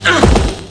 jump; mcclane

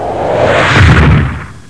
jump; starscream

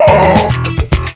pain; velvetjackson

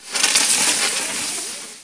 flare, weapons

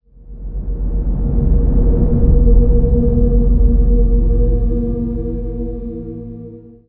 ambience

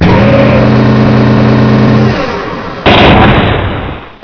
door; phatal; foredoor

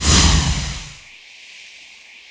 ice bolt; spells

